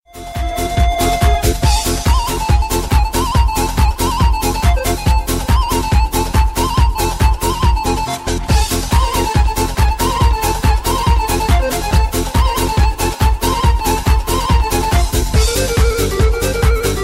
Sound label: Cute Tone